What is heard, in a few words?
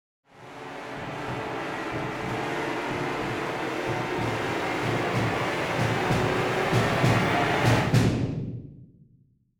Riser build up cinematic tension suspense